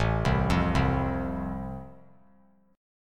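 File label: A Minor Sharp 5th, chord strum; Am#5 arpeggiated; JGuitar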